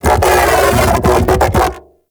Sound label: Mech Ambient Vocal